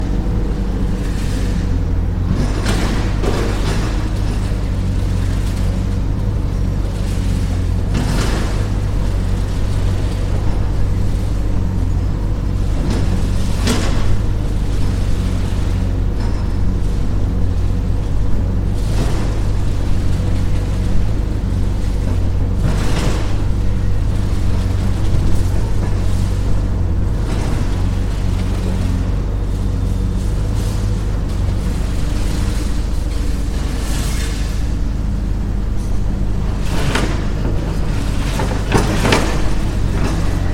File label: demolish building